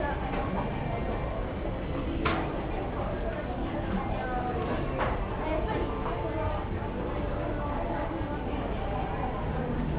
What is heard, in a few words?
airport, noise